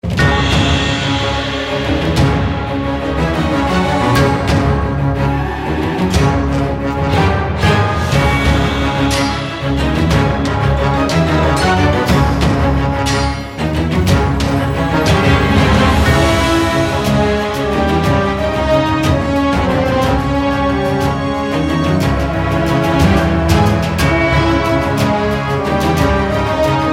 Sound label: Main Music